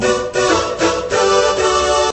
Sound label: error